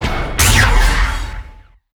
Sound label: Laser